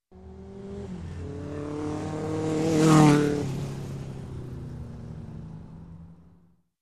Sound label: Bike passing by fast